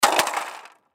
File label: Snow Shovel Drop, Snow Shovel Drop Rattle; Yard Tools; Foley